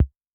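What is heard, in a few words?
bass drum Dance, Kick, Yamaha DTXpress-II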